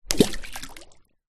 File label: sport diving stick throw into swiming pool water splash; zapsplat